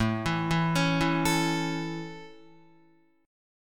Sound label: A Major, Guitar; arpeggiated